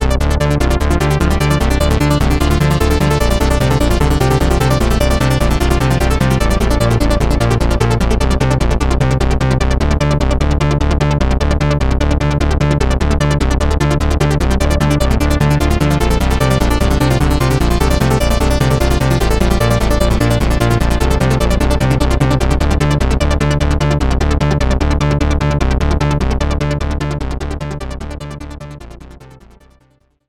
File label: sequencer notequantizer sampleandhold